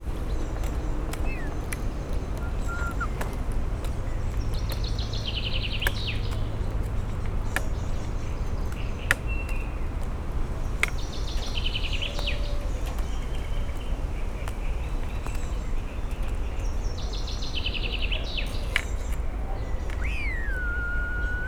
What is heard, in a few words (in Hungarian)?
négyszer szólal meg, kígyászölyv; szegedi zoo 2011